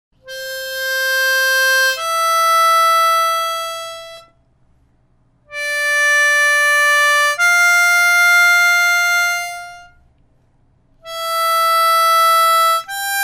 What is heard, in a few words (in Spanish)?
Ejercicios Notas Simples con movimiento ligadas, armonica